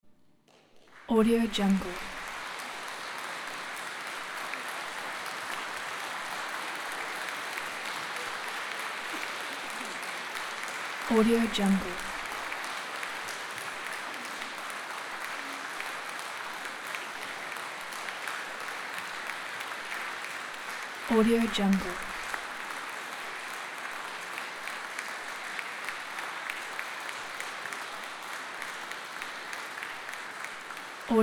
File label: applause after concert